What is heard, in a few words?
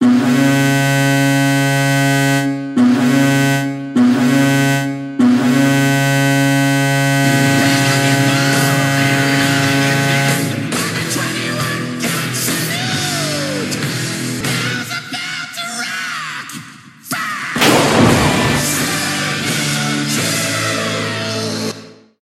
Goal Horn